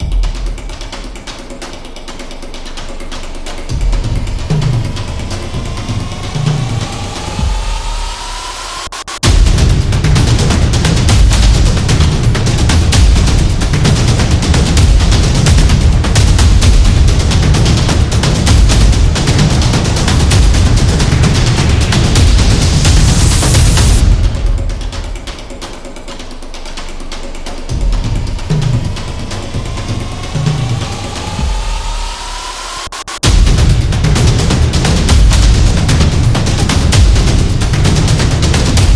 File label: Action